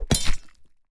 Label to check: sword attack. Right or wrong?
Right